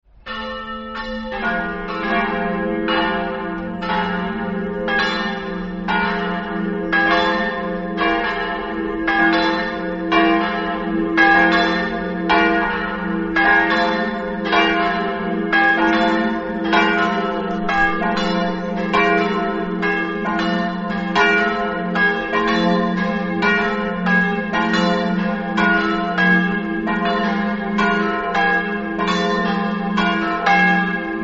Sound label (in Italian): torre, campane